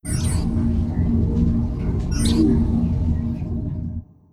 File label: ship bridge; Stellaris